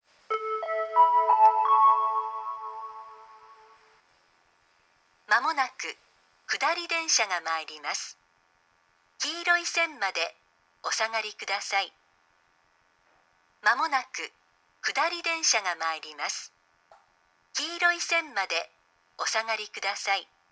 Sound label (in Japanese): kudari sekkin; atsugi; tateyama